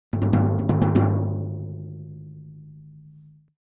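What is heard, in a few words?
Drums Toms B Shrt Battle, drums tom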